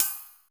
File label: EFX CYM; Crypton - DrumBase5000